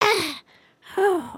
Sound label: trip, emotes